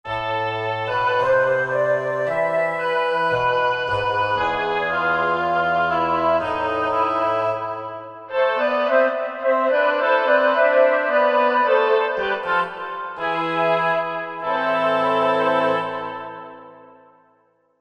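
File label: Wind Ensemble, Korg MSC-1S; Korg MSC-1S Variety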